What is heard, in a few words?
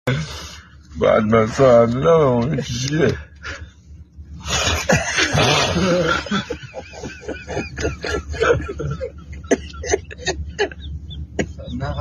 hendone khordan mard; هندوانه خوردن و خنده; میم